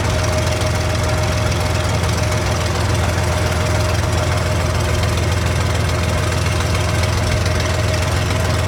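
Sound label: Plane P40 Interior Taxi Loop; Airplanes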